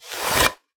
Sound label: board draw; hammer; melee; tfa starwars melee weapons pack